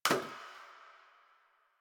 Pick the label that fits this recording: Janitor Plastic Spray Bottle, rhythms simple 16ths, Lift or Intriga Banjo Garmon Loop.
Janitor Plastic Spray Bottle